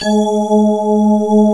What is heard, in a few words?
D50 Layer, Roland